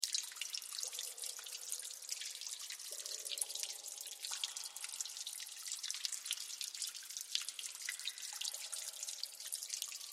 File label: Drips Cave Cavern, Water Drips Cave Cavern Reverb Loop; Dripping; Blastwave